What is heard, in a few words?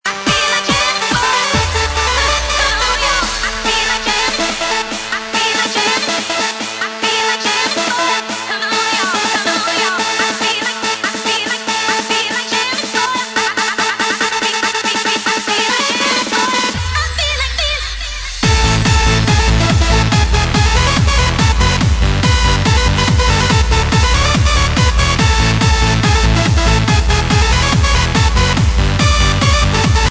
remix